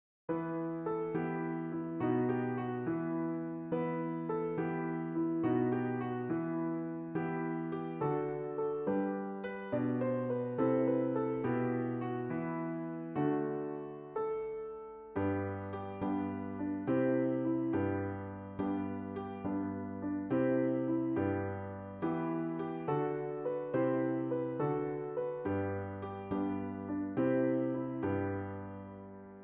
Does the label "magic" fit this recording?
No